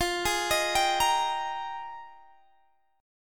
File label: F Minor 11th, guitar chord